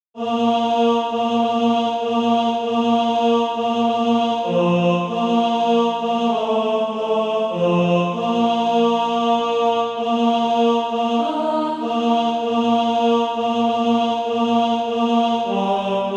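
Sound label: tenor; SATB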